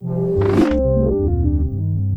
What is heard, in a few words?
game lose; balatro